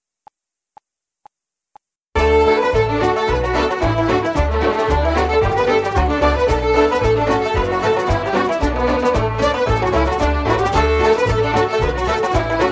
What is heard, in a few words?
Irish Ceili Reels Medley D, backing track